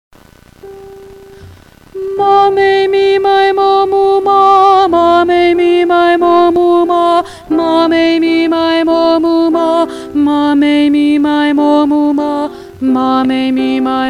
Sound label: Warmup, Vocalization